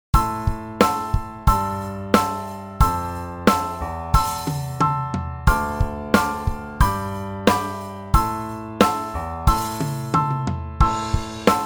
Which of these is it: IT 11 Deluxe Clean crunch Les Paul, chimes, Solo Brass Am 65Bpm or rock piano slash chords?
rock piano slash chords